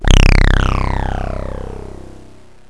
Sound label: analog